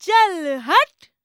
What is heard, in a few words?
VOICE, EASTERN; ILIO; Vocal Planet